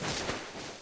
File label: foot slide; hl2; zombie